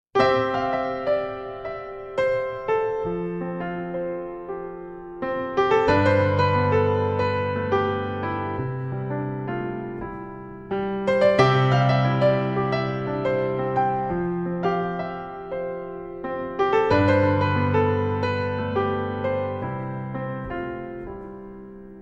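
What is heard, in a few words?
glory instrumental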